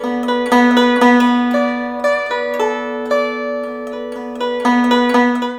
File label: Guzhen; Asia